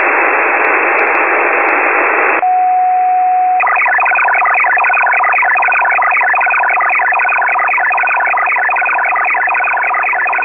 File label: 6990 kHz; Plath